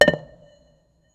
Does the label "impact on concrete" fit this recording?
Yes